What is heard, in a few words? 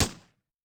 Gun, Yamaha DTXpress-II FX